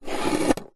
food drink plastic straw slide in plastic fast food drink lid; zapsplat